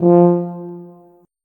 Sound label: tuba note